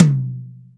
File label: TOM, Drum Dry; East Collexion; Drum 1